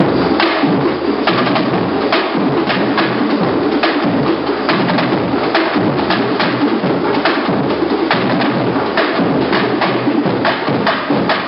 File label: break, SAMBA; vila 2012